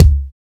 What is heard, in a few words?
LOWBROW KIK; POP-DNC 2